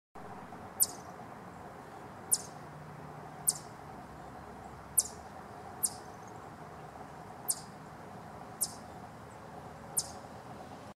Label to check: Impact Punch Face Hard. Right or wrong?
Wrong